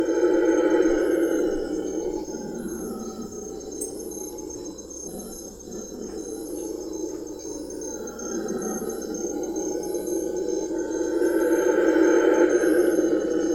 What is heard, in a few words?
bolivian red howler alouatta sara